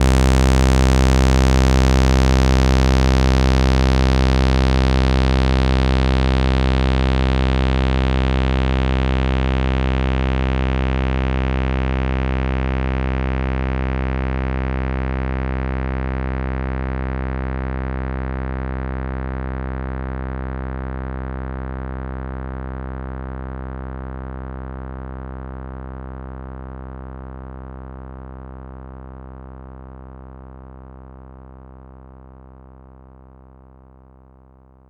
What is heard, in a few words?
enveloppe max saw real moog; MiniMoog; gmedia minimonsta; ohmforce